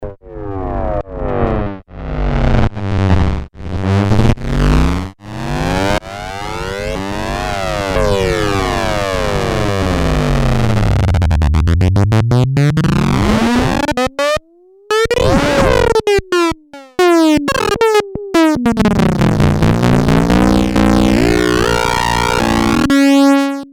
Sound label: plane, Moog minitaur